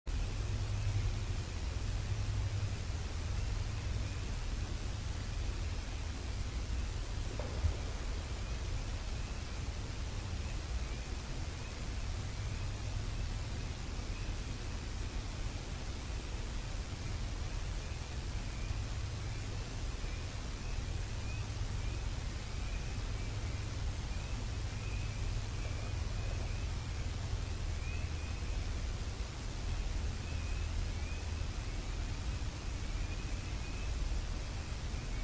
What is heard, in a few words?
wood knocks